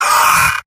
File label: pain sharp; medic; video games; source engine; tf; mvm